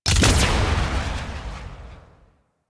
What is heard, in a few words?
Railjack Ship EMP fire; Warframe; DE; Vehicles; RailJackWeapons